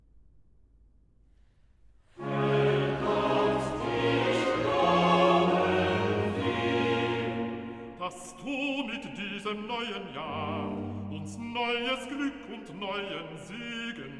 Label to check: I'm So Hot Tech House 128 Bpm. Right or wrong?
Wrong